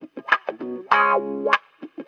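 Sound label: guitar, Roots Funk; USB Soundscan vol.41; AKAI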